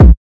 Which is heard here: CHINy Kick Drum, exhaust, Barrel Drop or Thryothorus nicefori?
CHINy Kick Drum